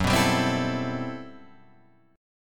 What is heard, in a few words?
G Major 13th